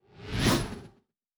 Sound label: Fly By, Sci-Fi Sounds Movement